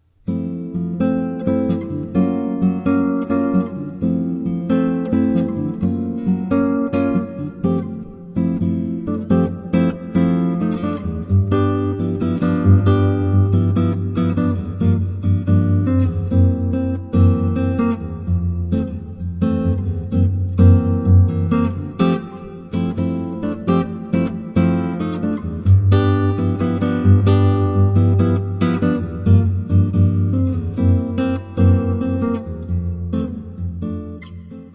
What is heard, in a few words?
Guitar